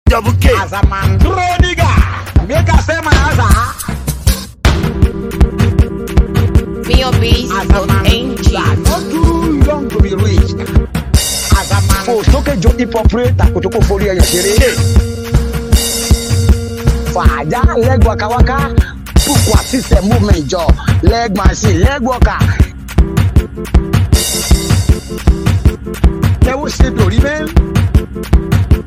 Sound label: Beat